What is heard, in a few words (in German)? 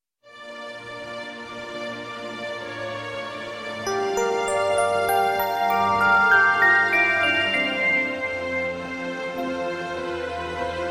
Wiegenlied